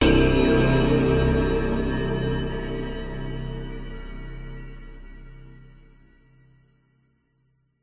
horror stab dark sinister mysterious

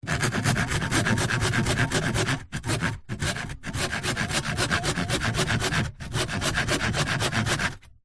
industrial hand saw sawing wood hollow fast pace

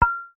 impacts glass small pane knock single; zapsplat

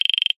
cartoon knocks fast wood rattle

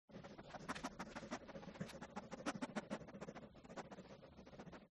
DOG Stafford Sniff; ANIMAL; smartsound

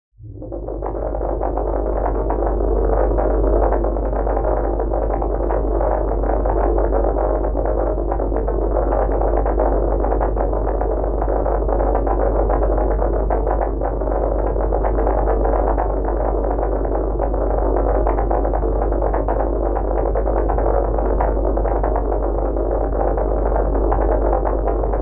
Digital Crusher; martyn stonehouse

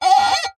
foley glass jug thick finger rub squeaks

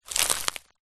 footstep forest floor crunchy leaves; foley

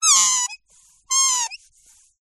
toy squeaky squeeze broken

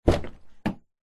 vehicles suv door open in garage nissan patrol

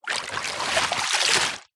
foley plastic bag empty lift out of deep water

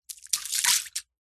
nature ice slushy melted pick up drop splash

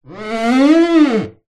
household mirror wipe clean

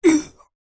human male vocalisation hit pain short

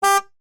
Truck Horn Single; noise creations

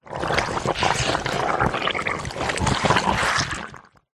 cartoon slime gooey mess movements; zapsplat

